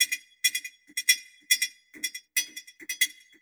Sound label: metal; ILIO - Ethno Techno